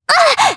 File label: Vox Damage jp; Sonia; kingsraid audio